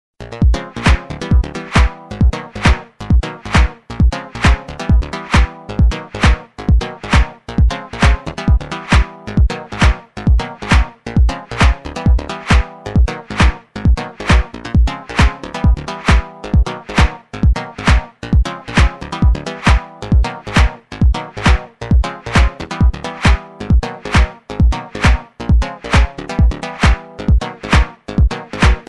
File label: funk piano mix